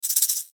Musical Rattle, Musical Rattle Shaker; Blastwave; Sound Effects:Musical

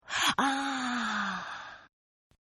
Gasp Aaah Female; Gasp Aaah Female 1; Gasping Sound Effects

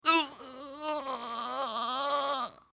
Human Female Grunt Long Strain; Grunts; Voice

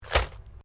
Organic stab; Various Organic Foley sounds; Stabs and Slices; Combat

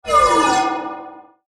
Metallic Tunnel Fly By Bright Reverb; Production Element Imaging Element Accent Transition; Metallic Sound; Blastwave

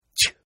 Sneeze; Sneezing; Human; Partners In Rhyme

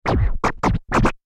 Record Dj Scratch Spin; Musical; Blastwave; Sound Effects:Musical

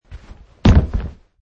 Landing

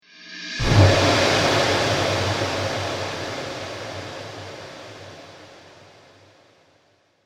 Cinematic Strike Fallout, Trailer Sound Effects

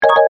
Multimedia Chime, Technology, Multimedia, Chime; Chime Sound Effects; Chimes (Sound Effects:Multimedia); BlastWave

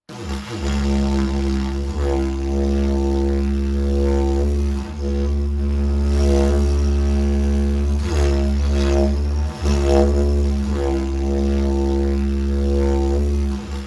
Sound of Australian Didgeridoo; Miscellaneous Musical

